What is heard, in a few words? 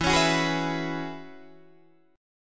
E 6th Add 9th E down Guitar Open D